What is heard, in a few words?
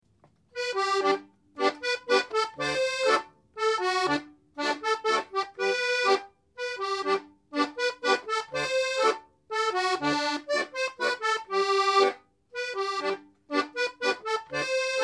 mazurka de bas pays